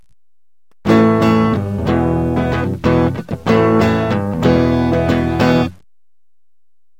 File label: blade clean